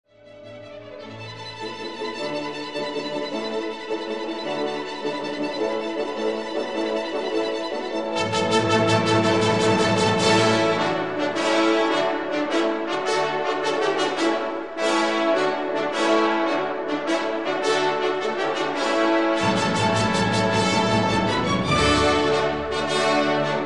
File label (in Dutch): hoorngeschal; Giselle